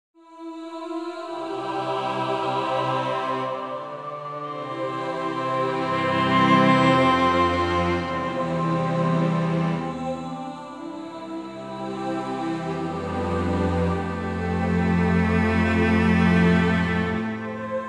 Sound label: winter voice